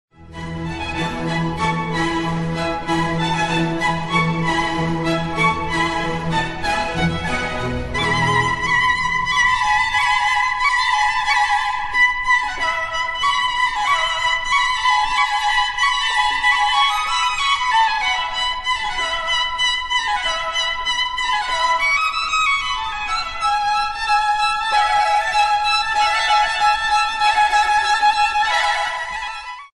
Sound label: Spring; Vivaldi